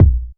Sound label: hot bass drum one shot b key, Royality free kick tuned to the B note